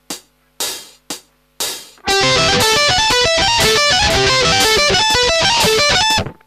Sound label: advanced arpeg